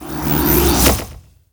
Troll Dodger Disappear

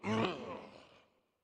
Death; Dinkubus

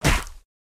Chop Impact; Caffiend

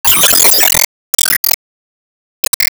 Enemy Gear Active Hit

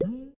Slime Vox Big; Going Under

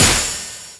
Enemy Jet Skill Shield Reflect; gamerip; disney star smash; android

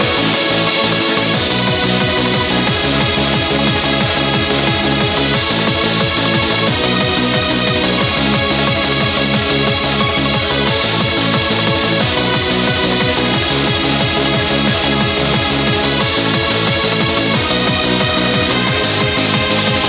Bgm Reach

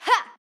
Swipe Normal; Going Under; Jackie

